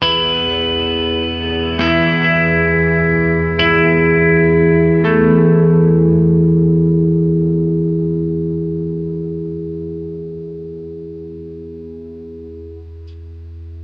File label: when the electric guitar